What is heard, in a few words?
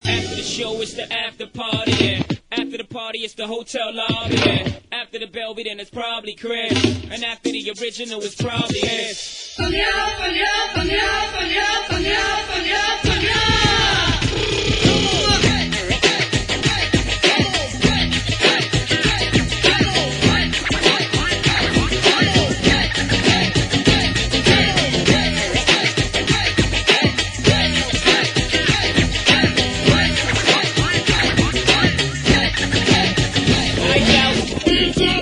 Hip Hop Bhangra; Punjabi